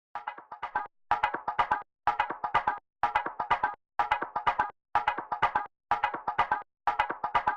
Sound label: PERC; DECAPITATOR